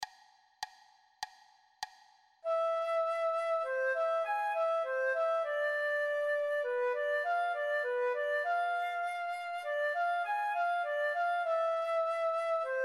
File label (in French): Sol diese, flute a bec